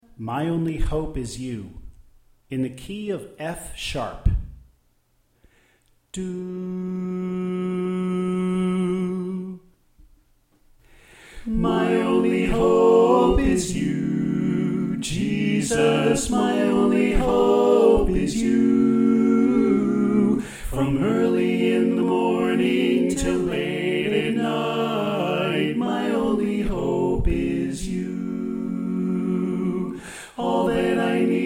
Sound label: Soprano Left